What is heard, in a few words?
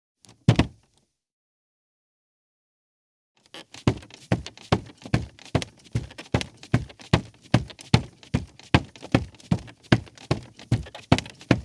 Footsteps, Foley; Sound Ideas 6016